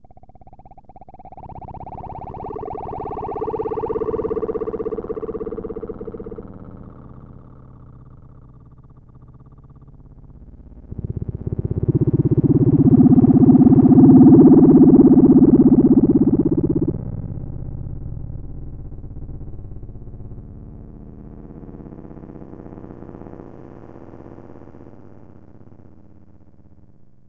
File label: Theremin; Leon